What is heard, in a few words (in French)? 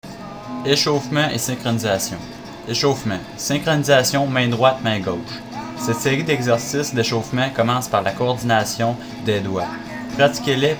echaufement, guitare electrique